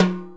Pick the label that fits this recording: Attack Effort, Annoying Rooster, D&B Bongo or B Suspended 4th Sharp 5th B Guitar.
D&B Bongo